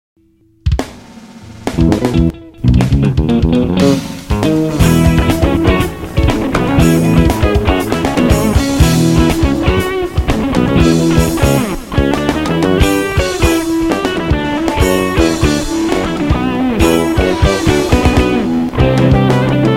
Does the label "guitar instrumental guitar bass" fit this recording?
Yes